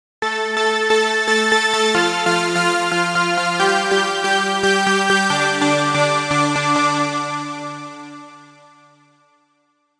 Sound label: Big Key; Curve 2